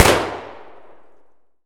saiga muzzle mono distant, weapons

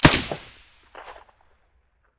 sa58 silencer stereo

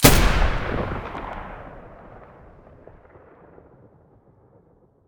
muzzle g36 stereo, weapons

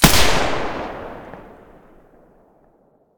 silencer g36 mono, weapons

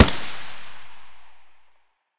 ak74u muzzle mono distant, weapons; STALKER; IWP

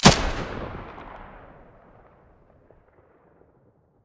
muzzle stereo

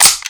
wa2000 empty

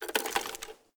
pkm draw

